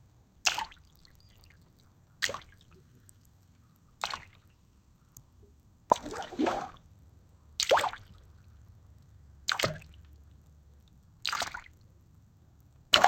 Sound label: water splash, lake